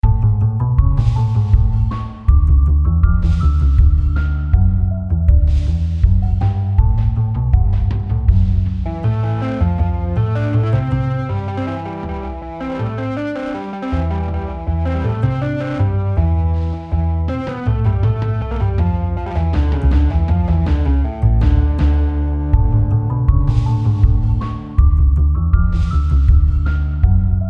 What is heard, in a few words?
Polska